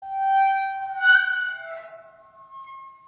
Rusty gate opening sound; Pokemon Essentials; Pokemon Opalo

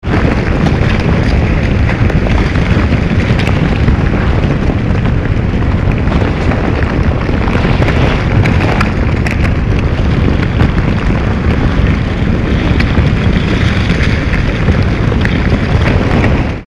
earth tremor; easyrpg; WitchsHeart

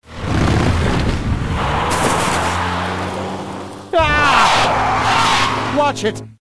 HIT DUST; Full Throttle; ScummVM

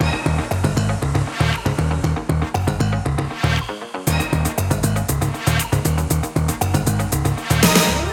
Sewers and Subway Intro, Music; Episode05; TMNT

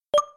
Menu Select; Pokemon Essentials; Pokemon Iberia V1.03

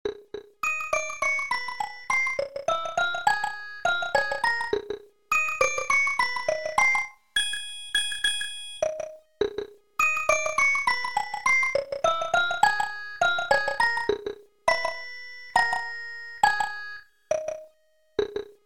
sleep, music; SuperMarioWar

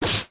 hit; Pokemon Essentials; Pokemon Iberia V1.03

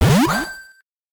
Battle jump to ball; Pokemon Essentials; PKMN Estelar 1.3

